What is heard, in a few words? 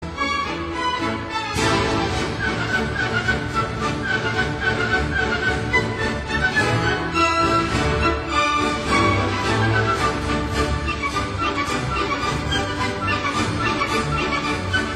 Radetzky March